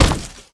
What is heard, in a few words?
step walk